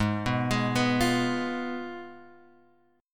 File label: Ab Minor 6th, Guitar, Open G; down